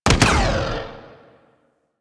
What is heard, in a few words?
Spear Gun Shot; Warframe; Weapons; Corpus